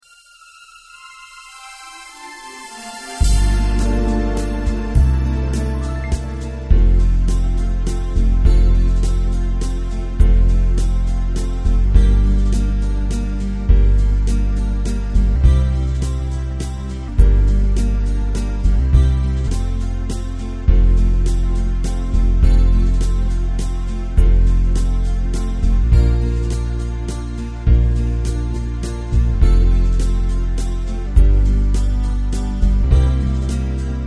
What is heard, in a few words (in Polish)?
dobroc instr